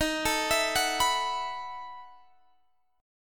B 11th D sharp, chord

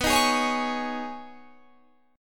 C sharp 7th Suspended 2nd B

